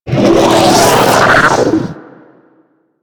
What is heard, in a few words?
Capital Ship Missile Whizzby; Warframe; Corpus